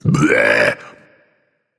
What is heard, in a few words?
tongue, voice; Smite; ymir skin14